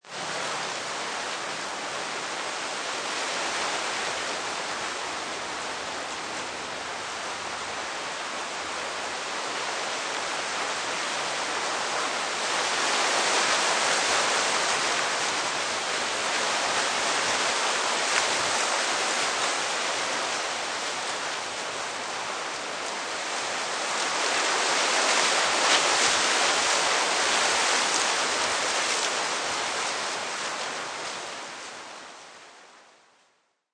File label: Wind Palm Trees, Wind gusting and blowing through palm tree leaves